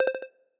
Ping, error

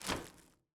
empty trash; finder

